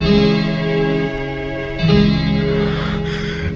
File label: guitar, Jazzmatazz loops